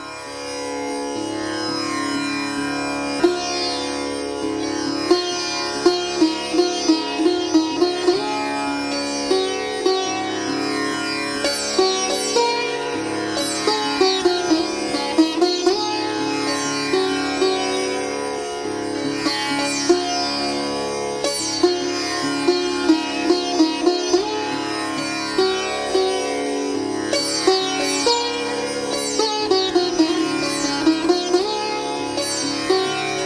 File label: sitar tampura tabla